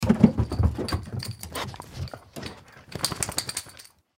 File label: Dog Run Up Stairs, Dog Run Up Stairs Pant Shake Fur Collar Jingle; Animal; Dogs; Blastwave